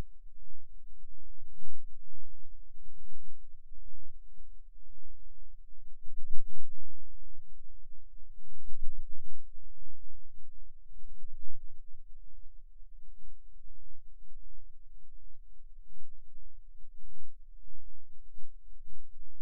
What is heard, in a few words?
Rhythm Wave, eeg seizures